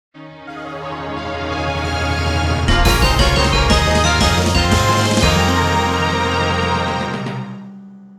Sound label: all clear, midi